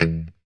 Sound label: JC Pop B, Guitars, JC-Strat Pop